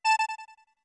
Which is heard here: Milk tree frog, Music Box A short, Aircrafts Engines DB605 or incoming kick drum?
Music Box A short